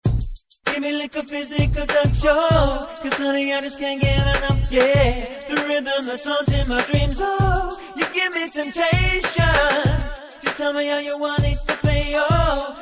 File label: Vocal Attack